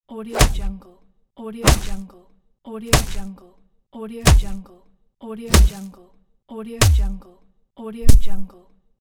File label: punches cartoon